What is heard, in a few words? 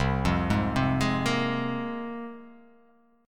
C 7th Suspended 4th Sharp 5th, C7sus4#5 chord; C7sus4#5 arpeggiated